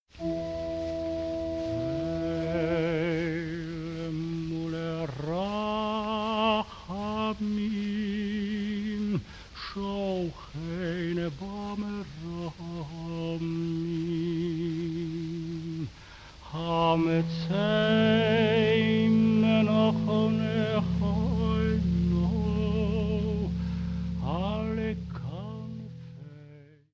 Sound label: El male rabamin Prière des morts